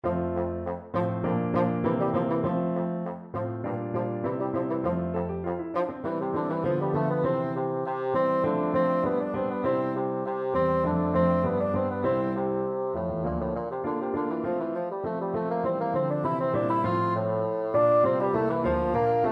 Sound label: galliard sonata, bassoon